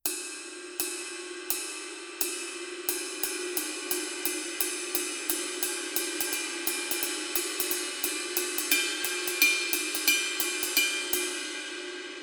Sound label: giant beat 26 giant beat pattern, paiste